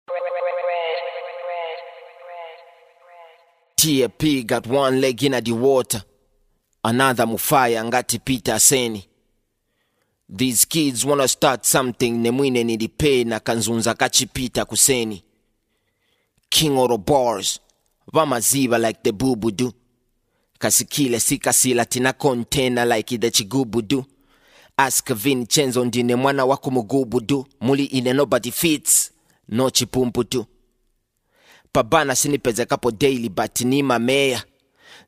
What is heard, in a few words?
Bar Capella